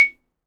XYLOPHONE, Percussion; Synclavier; World & Orchestral; ILIO

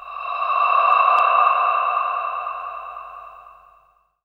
atmo; Techno Trance Essentials; FX & ATMO

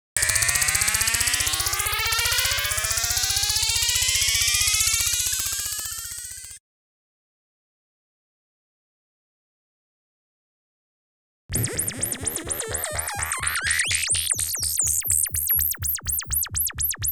EMS Seq, Rhythmic; Klaus Schulze Collection

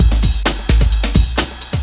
Loop, Roland; Sound & Vision - Gigapack I

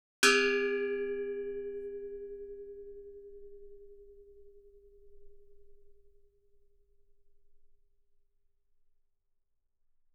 Gamelan; Propeller Island - Complete Gamelan

